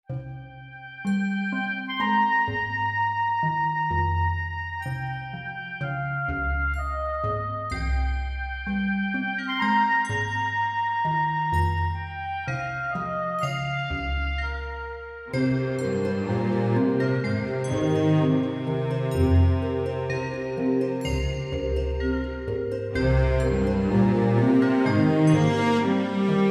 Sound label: GTR